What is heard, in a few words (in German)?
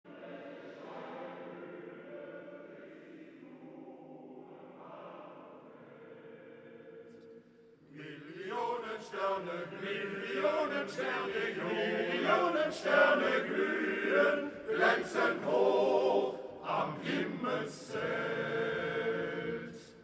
Weihnachtsglocken; MGV